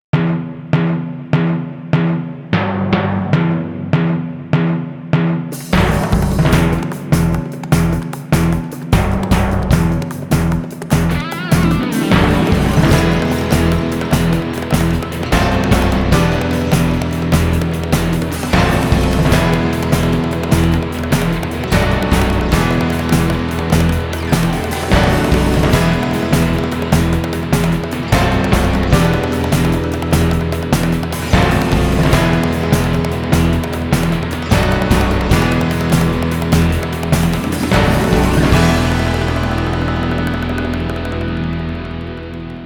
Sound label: Rythm Premix